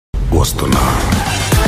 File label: telugu background music; Devara